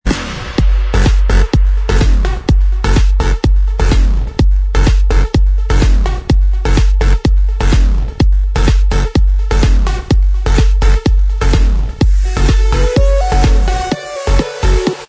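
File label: Instrumental Track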